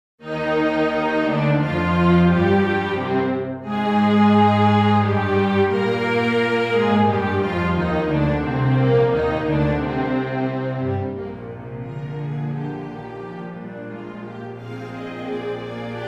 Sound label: conc sax; Glazounov